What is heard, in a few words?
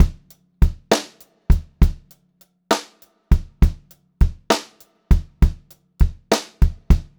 Pop-Rock Drumloops, 100; USB Soundscan vol.37; AKAI; 90 s Sample CDs